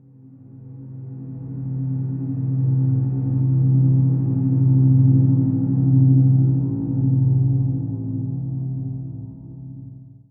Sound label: Title Transition Stab Dark Mysterious; Production Element